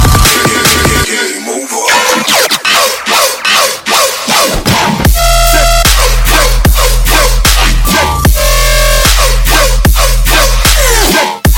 game over

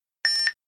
police radio beep boop

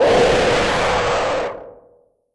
jumpscare; golden flumpty

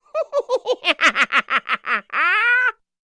pumpkin sound; petz 5

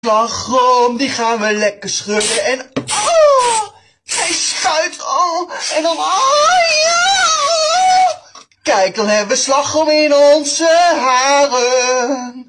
aaaahhh

